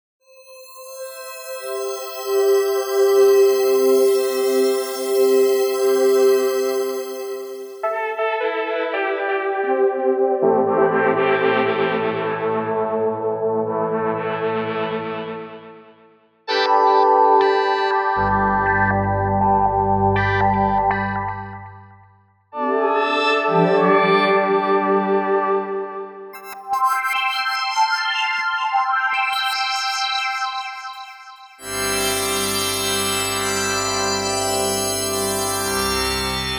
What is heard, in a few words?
pads